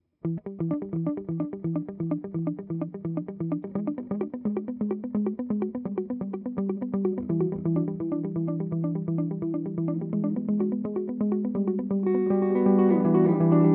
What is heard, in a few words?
GTR Delay; guitar; Carbon Delay